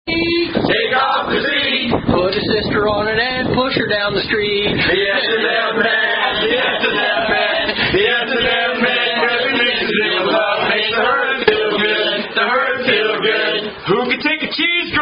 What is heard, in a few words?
Hash House Harriers Singing; The S&m Man